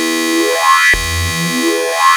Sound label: OSCAR F2, Rare Synthesizer; Hollywood Edge - Giorgio Moroder Rare Synthesizer Collection